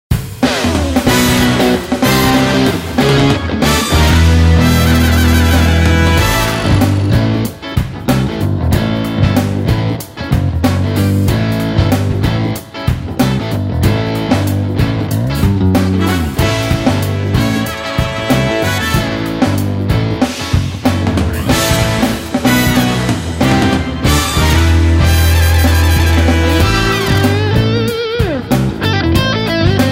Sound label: Funk Fusion